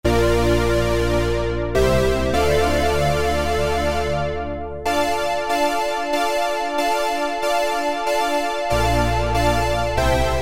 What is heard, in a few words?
Synth Pad, Roland Juno-106; Factory Preset